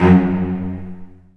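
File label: Cello Spiccato F#1; Digital Sound Factory Virtuoso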